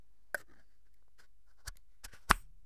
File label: Spray Packaging putting cap back on